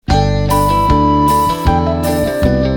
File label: sms